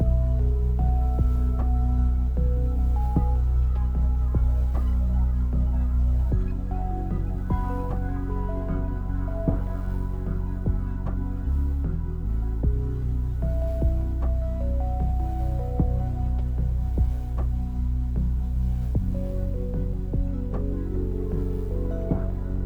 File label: Exploration Night 8CH 76BPM